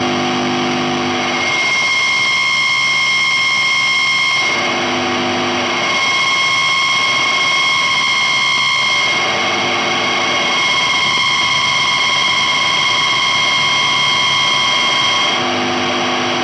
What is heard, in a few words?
static noise